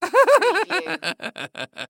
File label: Laugh Crazy Male; Sound Effects Bible